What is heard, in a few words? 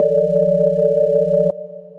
Telephonic, Synth Keys; Ableton; Glitch and Wash